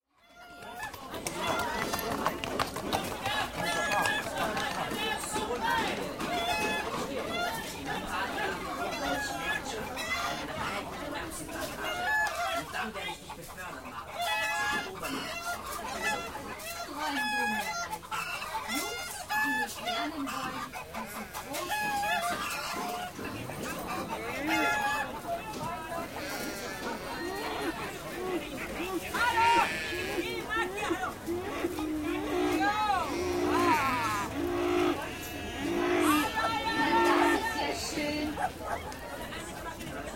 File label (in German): geraeusche markt